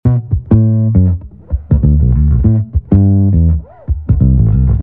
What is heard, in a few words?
Bass B,A 100, Electric